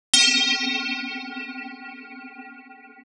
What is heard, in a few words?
metal